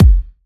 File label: Hard Kicks; Vengeance Samples